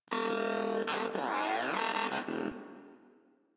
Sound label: Moa Aggressive Vocal Battle Ends; Warframe